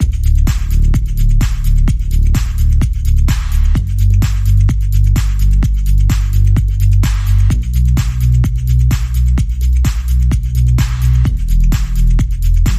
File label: Heading Home Drum and Bass